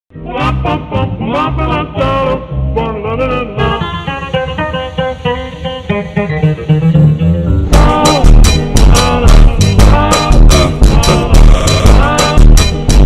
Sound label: funk super slowed reverb; bad parenting